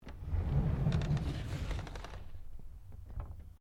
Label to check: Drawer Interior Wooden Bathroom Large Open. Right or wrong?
Right